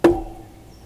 Gourdin buche Tom median; Percu Bois

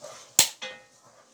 bois craquement sec; Percu Bois

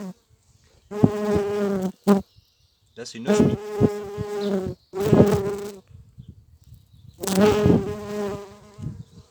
Abeilles Osmie fort mais coups de vent; Vols Bourdn abeilles

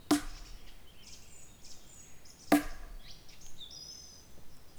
2x Batons secs Legere Reverbe, Percu Bois

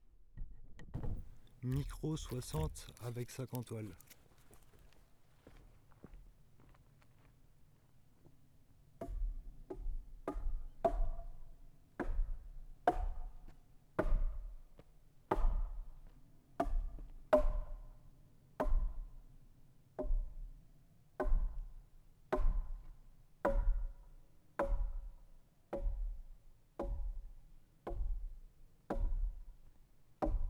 Gourdin Buches sac en toile, Percu Bois; NUIT

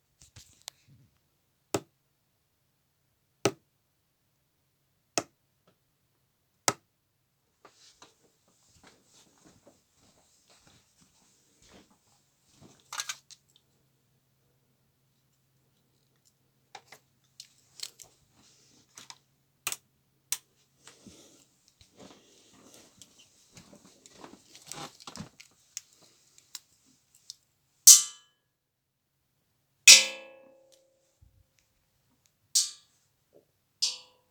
bruitages percussif traverse maison ht bas

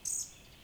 Trii, Oiseaux

